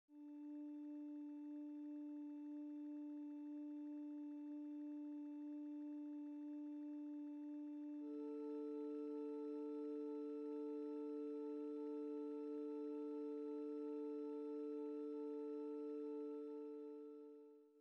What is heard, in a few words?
hum